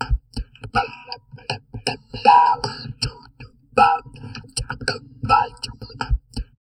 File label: TALK, Drum Loops Crazy Processed